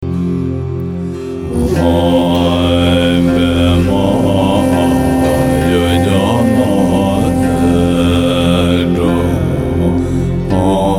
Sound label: Long Life Mantra; buddha within yourself